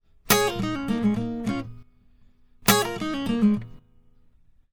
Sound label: 101 Pop Ac-Guitar Dbmaj; Pop Rock; Acoustic Guitar; Pro Tools Essential Loops; Digidesign